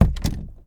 DOOR Metal Close Gently Short; mono; Universal Sound FX; DOORS & GATES

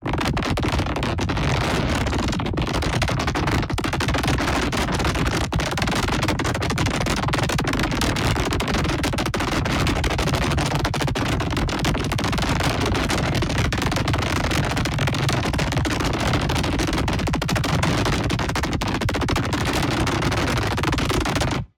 ABILITY CHARGE Gravitational Ripples; boom library; Matter

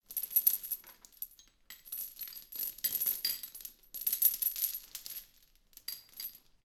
chain movement; DeadFright

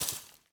weed jump; firefight

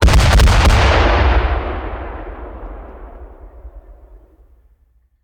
Mortar Cannon; Sound Ideas; Guns

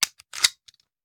Hand Gun Foley; Sound Ideas; Guns

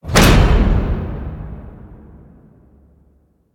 HIT Massive, Cinematic Hits Designed

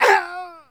Male Hit By Pitch Baseball

